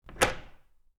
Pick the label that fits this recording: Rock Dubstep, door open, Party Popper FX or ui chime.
door open